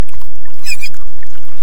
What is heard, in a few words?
Inia long call